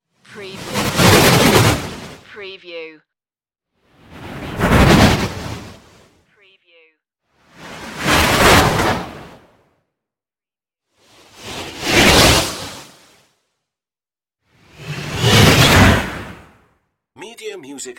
storm slide swooshes

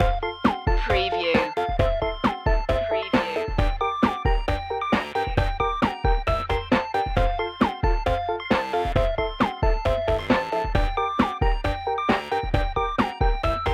gamer girl loop

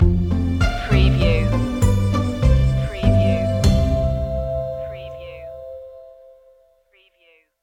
ukulele weekend stinger

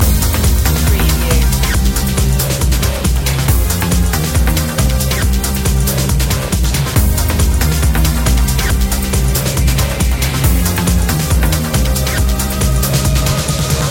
thunder cat loop

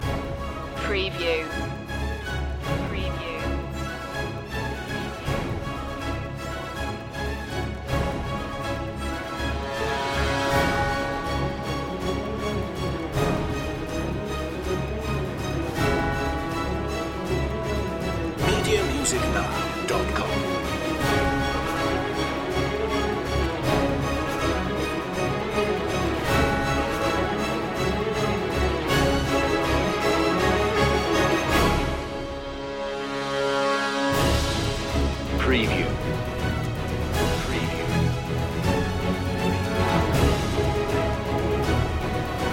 no choir

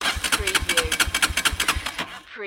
Motorcycle Dirt Bike Engine Turning Over

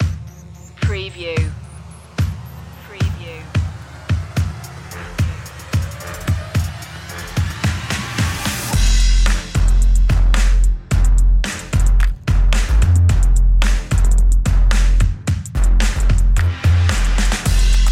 big jump drums and bass

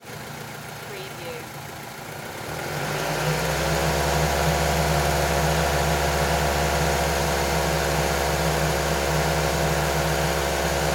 Car Mazda 3 Low Rpm

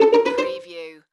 Pizzicato Strings

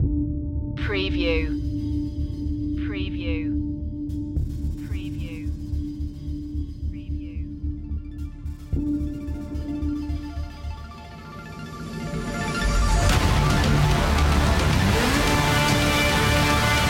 dystopian machine

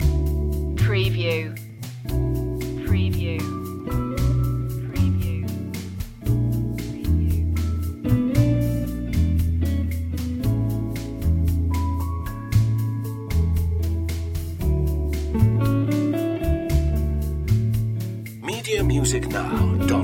bossa melody; chill out music

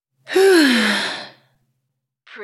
Female Soft Sigh

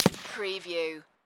Rifle Remington 7mm Single Shot Exterior At 75ft

